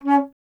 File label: Alto Flute